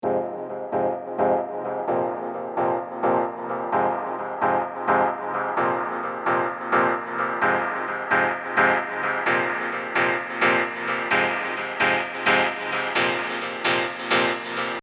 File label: Techno Chords